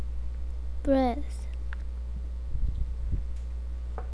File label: breath